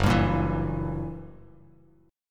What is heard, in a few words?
Gb 7th Flat 9th, Bass Standard; strummed